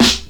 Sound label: crispy rap snare sound a sharp key; 90s